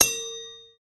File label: wrench; game